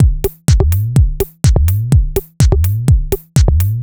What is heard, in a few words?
B 125bpm, House Drumloops; 90 s Sample CDs; Zero-G House Fabrik Drumloops; ZG Drumloops